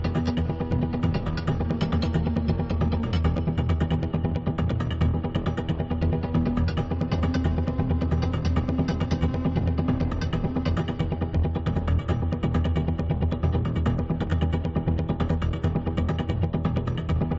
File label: chase music; granny